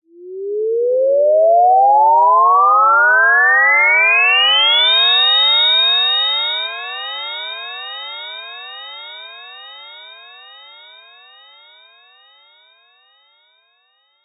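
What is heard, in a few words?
cartoon sci fi rise laser whistle delayed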